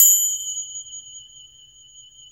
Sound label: TRIANGLE